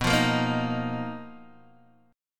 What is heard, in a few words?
B Major 7th Flat 5th B down Guitar Open D